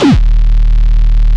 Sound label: BLOWZY BASS; Distored Reality 1&2